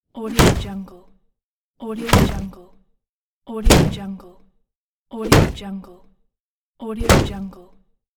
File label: shield hit